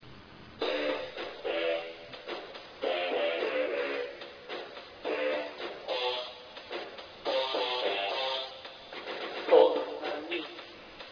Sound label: taiko